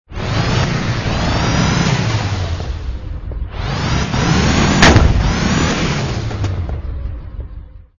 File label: Brum brum